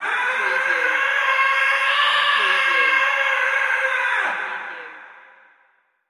Scream Processed; sound effects bible

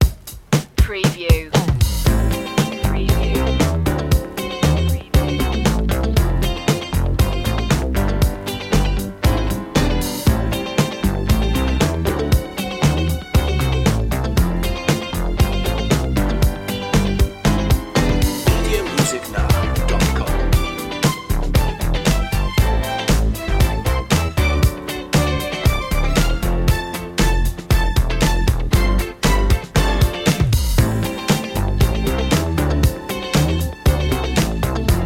boogie disco

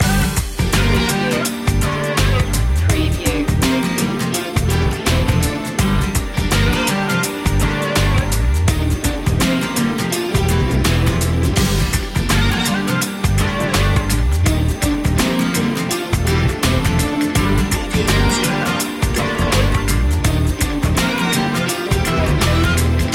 texas sun loop

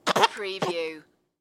Baby Sound

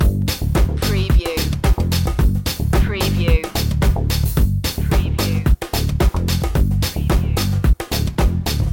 electro strum loop

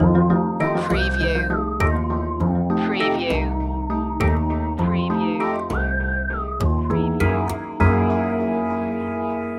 psychedelic dance loop a

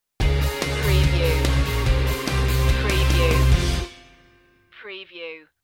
rock killers stinger

